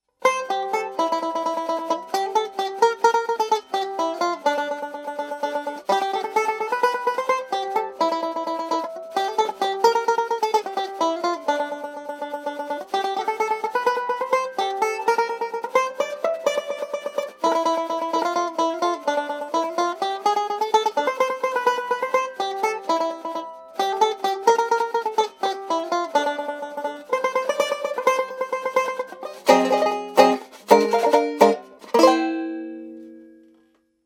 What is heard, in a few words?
tenor fall, banjos